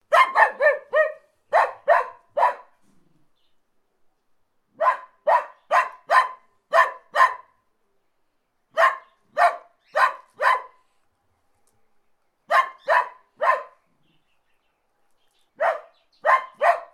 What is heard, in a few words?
Dachshund barking sound